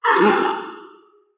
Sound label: dinosaur grunt